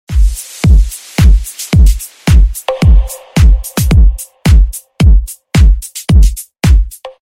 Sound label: Levelend